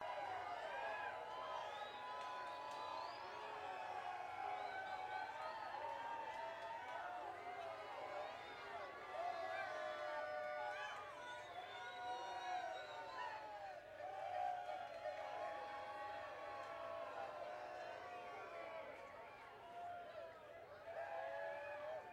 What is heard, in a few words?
Banter Crowd